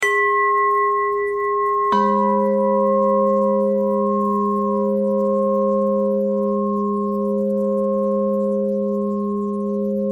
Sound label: bell thread pentatonic